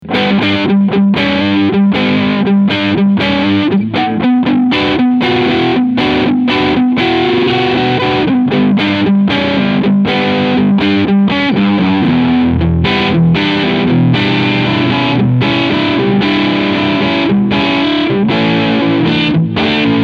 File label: Framus Crunch Sound beide PUs; Artist Line